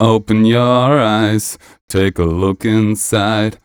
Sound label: m 127 mel a, Vocals